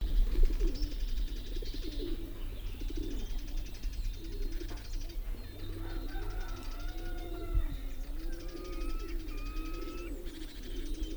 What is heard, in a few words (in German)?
Stadttaube; karl-heinz frommolt; deutsches tierstimmenarchiv